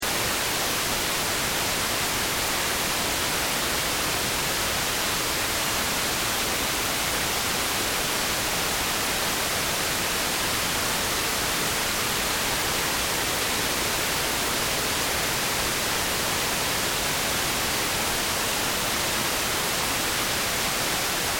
Quasar TV Snow, Television white noise, no reception; Noise & Static; Noise Sound Effects